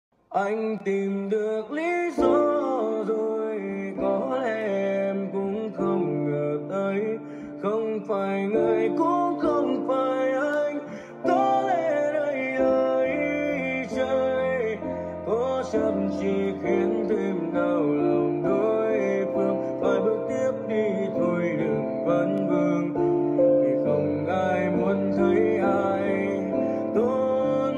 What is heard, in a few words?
cover piano